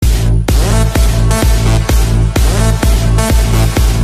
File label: bass drop